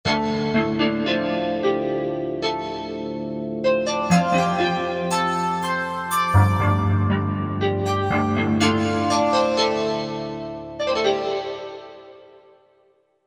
Cold Cave, Korg MSC-14S Sound Effects Combinations